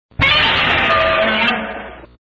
Roar sped up; Godzilla

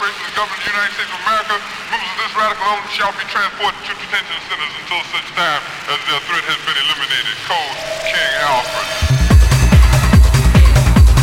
Drummer

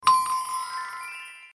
Login Sound; Ragnarok

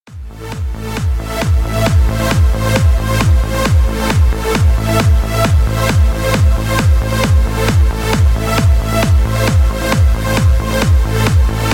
Electro

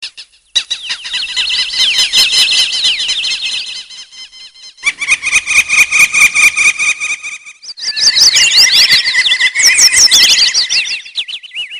Bird Chirps

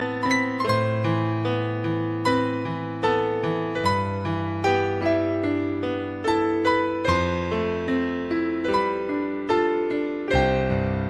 Instrumental Sad

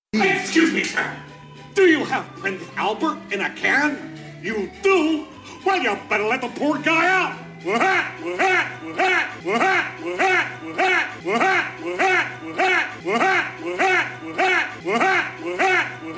Pennywise Ringtone

